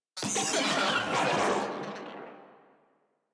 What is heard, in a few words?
Vocal Death, Enemies; Warframe; Moa